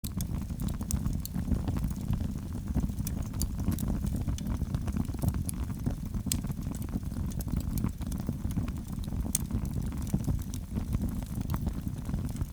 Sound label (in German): Lagerfeuer